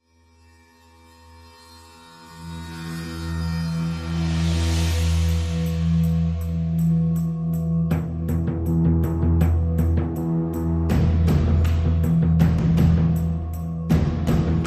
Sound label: No Melody